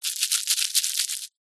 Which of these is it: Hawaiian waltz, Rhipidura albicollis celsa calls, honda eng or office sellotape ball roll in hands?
office sellotape ball roll in hands